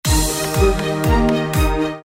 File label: sms; hollywood